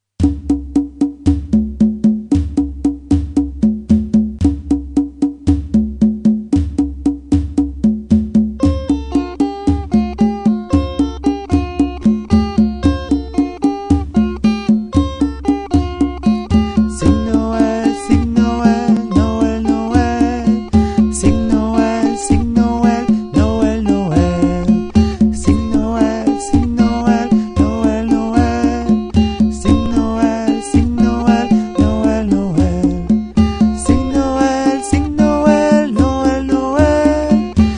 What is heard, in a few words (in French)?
NOEL sing Noel soprane